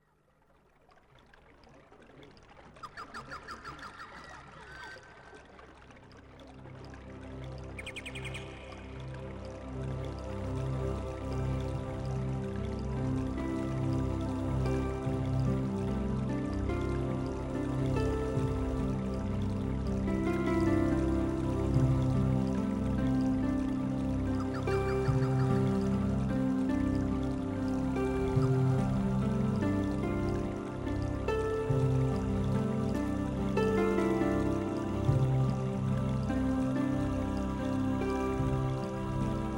Cool down - New Age - Ethno - Asian - Meditation - Reiki Music - Walking through the Fores; World Music